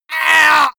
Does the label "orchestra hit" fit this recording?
No